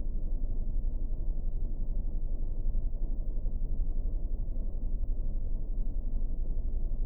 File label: room, drums; virtual drum sounds; custom drums